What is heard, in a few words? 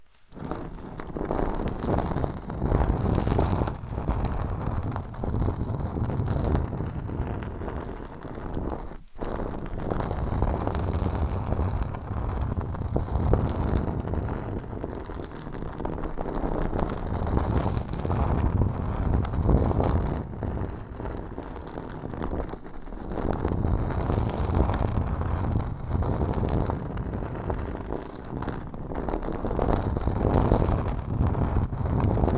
Big Paving Stone Dragged On Concrete Floor